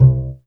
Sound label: Yamaha TG55 Jazz Man C2